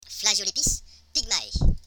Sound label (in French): plagiolepis, fourmi; phonologie